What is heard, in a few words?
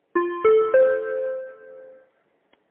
Tram